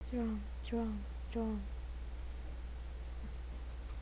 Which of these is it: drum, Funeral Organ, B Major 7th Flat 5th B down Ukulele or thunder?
drum